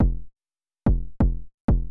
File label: kick, house, 125; eJay; Drum